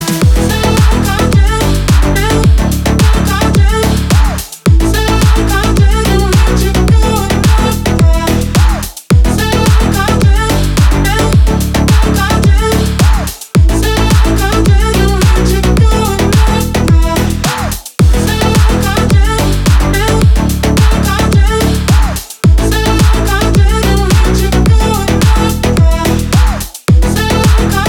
Rocking Car Bass

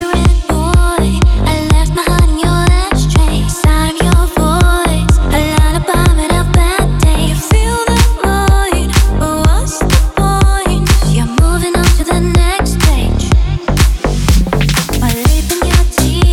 heavy metal

